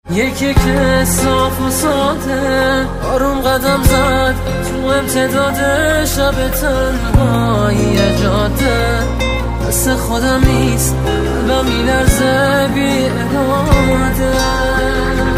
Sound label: shabe tanhaayi ringtone